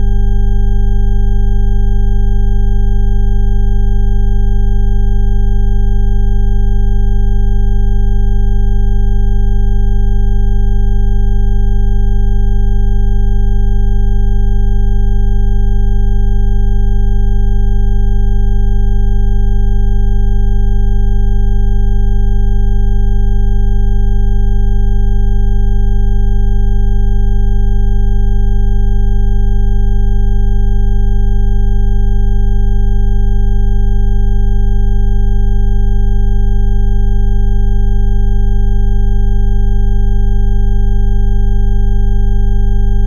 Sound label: harmonic